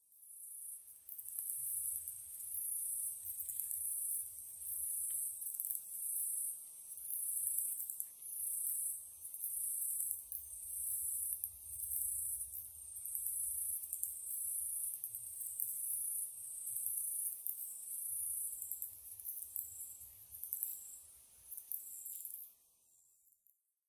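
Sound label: katydid; yamayabukiri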